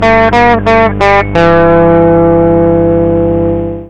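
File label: opamp, guitar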